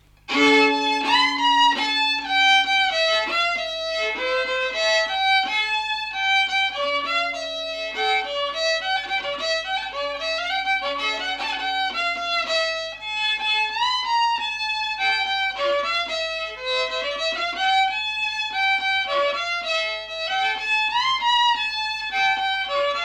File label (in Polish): melodie weselne